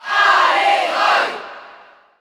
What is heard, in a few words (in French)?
Cheer French PAL; Roy; SSB4